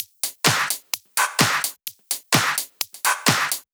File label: Drumloops 128BPM